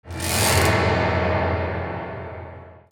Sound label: MUSICAL